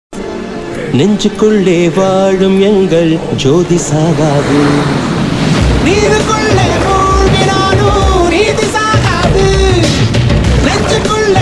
murugan song, tamil song; thiruchendur murugan song; lord murugan mass song

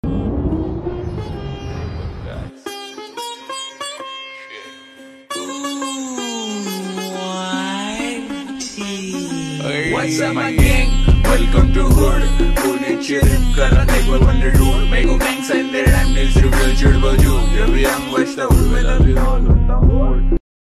instrumental ringtone

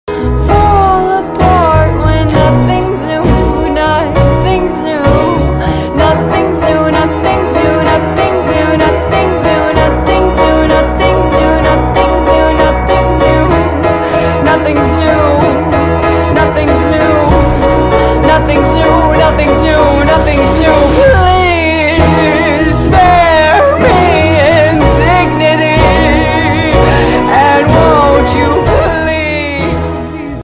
ringtone

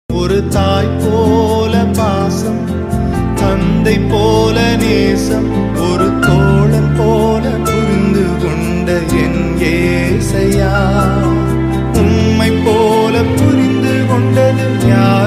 tamil worship songs, tamil christian songs

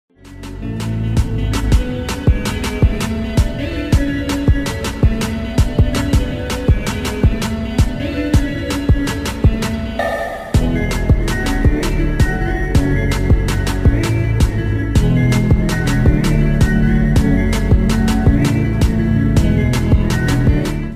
instrumental; football; goku; cr7

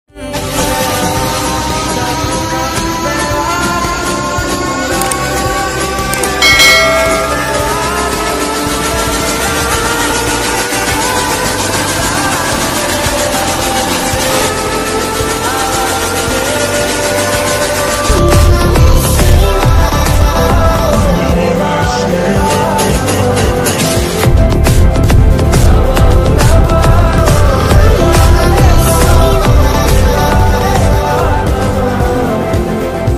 lofi

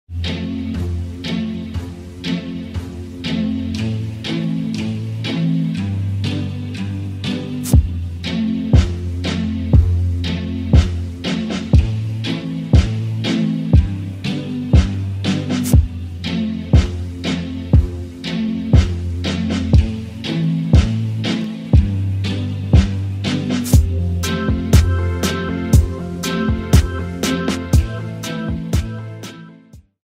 breathe ringtone instrumental

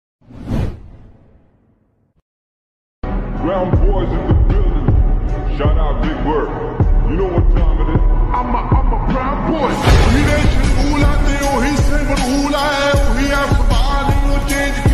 instrumental ringtones

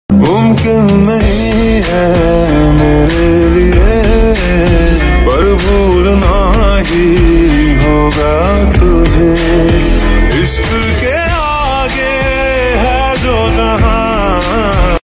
ringtone; half girlfriend

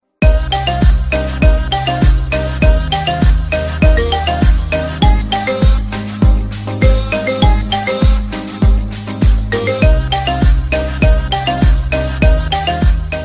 marimba remix ringtone instrumental

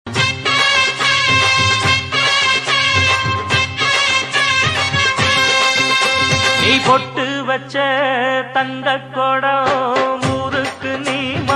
tamil bgm ringtone; lubber pandhu; dinesh entry

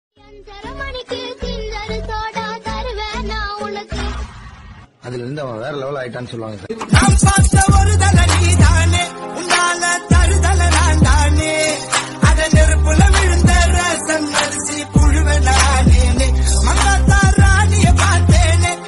tamil ringtone

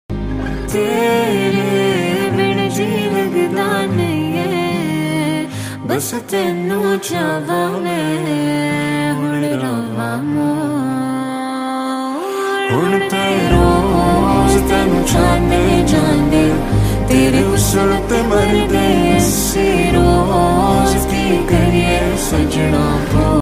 acoustic song music love lofi

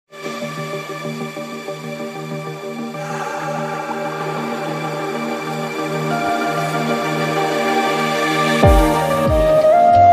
instrumental ringtone romantic mobile ringtone

